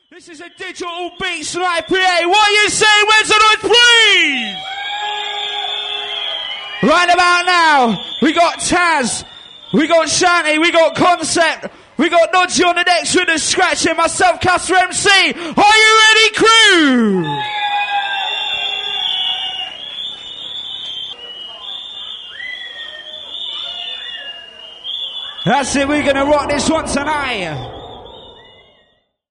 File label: digital beatz & electronic live pa intro